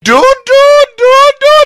doot

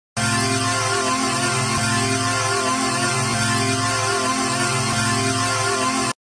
chord real

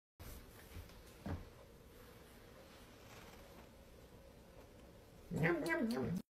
nom nom nom dog

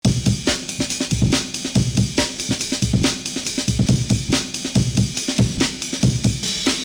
amen break sample

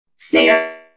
robot

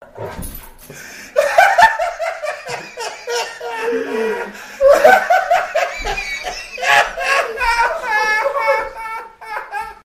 laugh

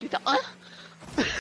ugh

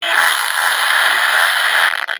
fart

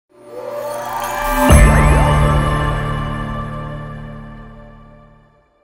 outtro sound

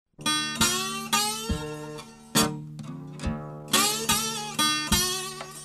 asian guitar

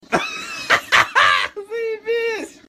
hahaha; hahaha-zaebis

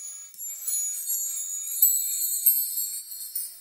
chimes

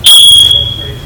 smoke detector earrape

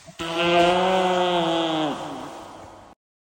fart

